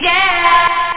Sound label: yeaah!